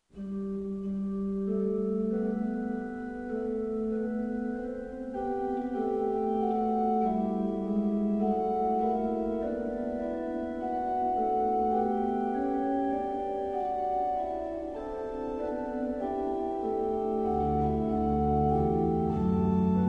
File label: organ